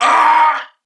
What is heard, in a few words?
Pain Corpus Venus Male, en; Warframe; CorpusVenusMaleB